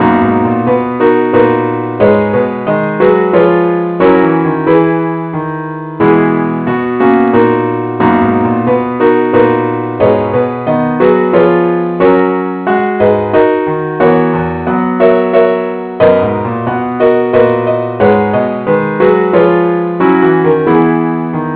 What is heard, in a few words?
keyboard only